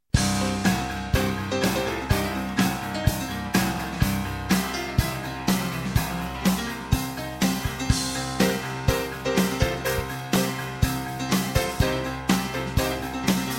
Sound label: American Raindance; Dobro